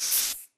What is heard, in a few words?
Rocket Hand Land; grabpack upgraded; pp3